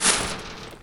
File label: chainlink, footsteps; player